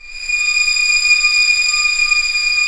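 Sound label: STR A0 PP, strings; Sound On Sound - Peter Siedleczek's Orchestra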